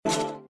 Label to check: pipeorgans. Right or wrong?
Wrong